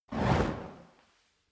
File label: fire torch whoosh fast; swooshes-swishes